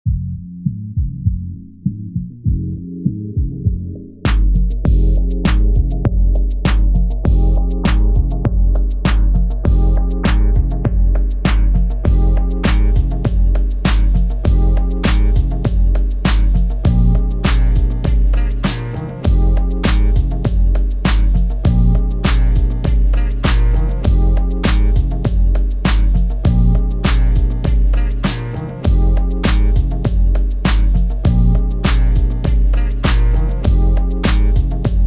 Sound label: Beat Blue F